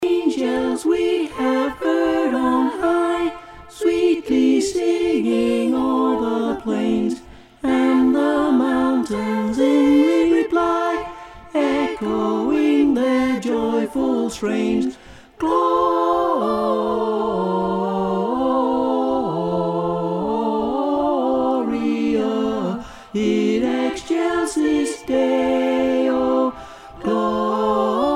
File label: Alto; Choir; Harmonies; Carols; Angels We Have Heard On High